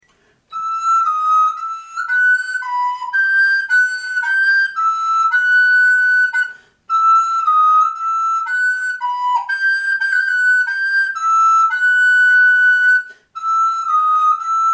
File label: menuet, FLUTE